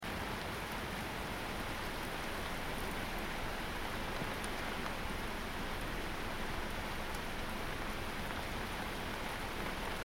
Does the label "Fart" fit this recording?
No